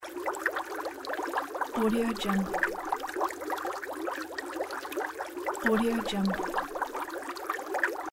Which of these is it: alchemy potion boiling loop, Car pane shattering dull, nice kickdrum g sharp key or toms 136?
alchemy potion boiling loop